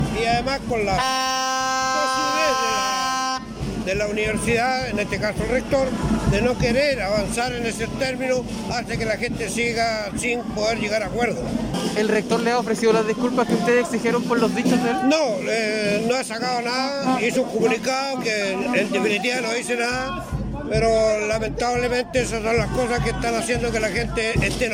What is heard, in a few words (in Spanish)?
CUÑA MARCHA